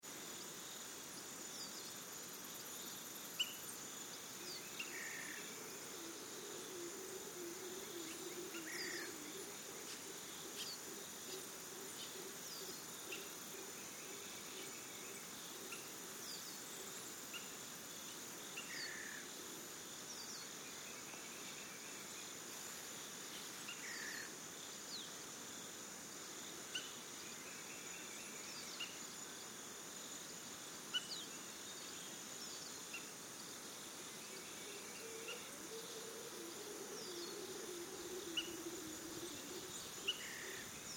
Birds Crickets Costa Rica Cliff, forests and jungle; Field; Backgrounds; Forests and Jungles